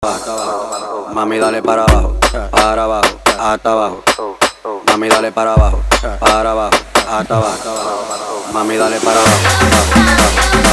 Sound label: Dale Para Bajo Mashp 130 BPM